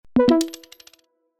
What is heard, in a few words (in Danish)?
SMS Modtaget; bounce